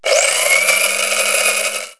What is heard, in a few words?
grinder, house kitchen; KABOOM! - Wild and Wacky Sound Software